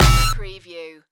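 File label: Production Element Hit Distort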